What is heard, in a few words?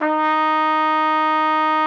TRUMPET D#4; Xsample VOL-3